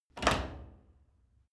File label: wood door old lock